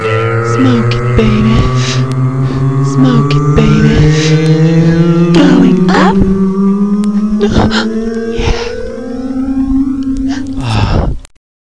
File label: going up